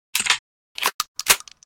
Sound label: ashot unload, weapon